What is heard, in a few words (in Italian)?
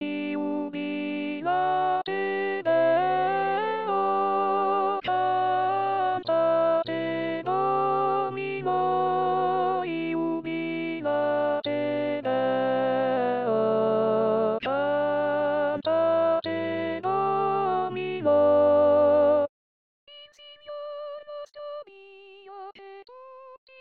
Contralti; Jubilate Deo